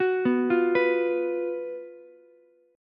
F sharp Major 7th Suspended 4th, Ukulele; F#M7sus4 arpeggiated

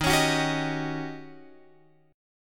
Eb 7th Sharp 9th, Eb, Guitar, Open F; strummed; x,10,13,13,10,10

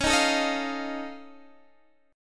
F Augmented 7th C sharp, chord

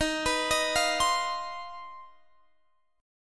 C Minor Major 7th Double Flat 5th D sharp

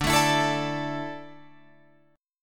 D Suspended 2nd D down Guitar Open F; strummed

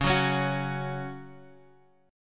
Gb 5th Db, guitar

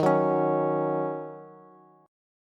Eb Minor 7th Eb Bass; strummed

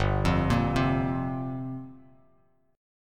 G Minor 11th, Bass Standard; arpeggiated; JGuitar

